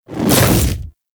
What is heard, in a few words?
Splitter Melee Swipe; Warframe; Infested; Grey Strain